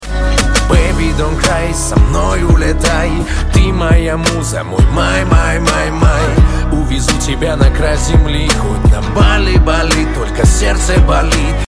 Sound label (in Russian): Песня